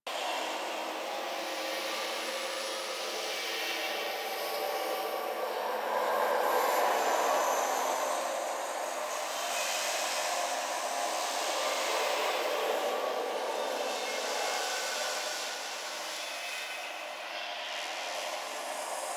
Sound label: Landscape Ambient Texture Loop; Warframe; Ambience; Venus Open World; Textures